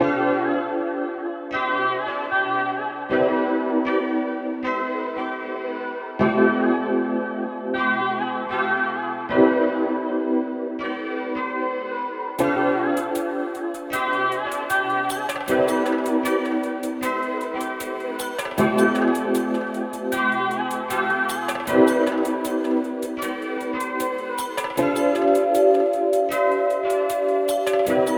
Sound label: chilling funk, 432 Hz